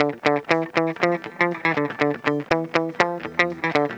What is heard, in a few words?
funky electric guitar; Garage Band